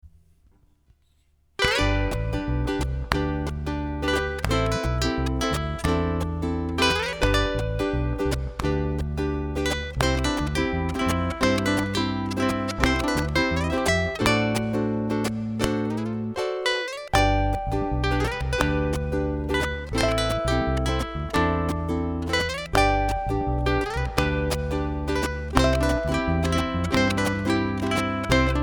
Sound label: Slide, ukulele micro song